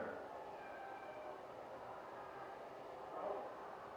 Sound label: high and low pass filters applied